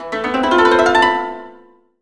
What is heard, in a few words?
koto gliss